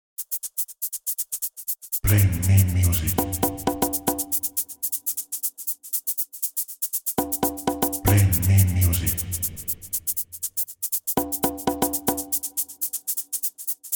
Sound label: DRUM; drum kit one